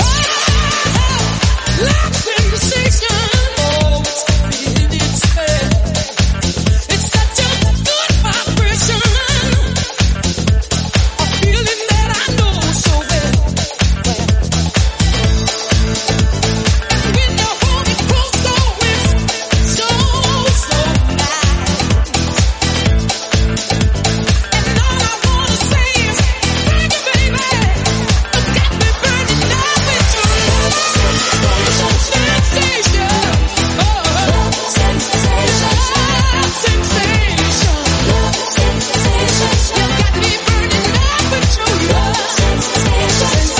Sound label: 126bpm Am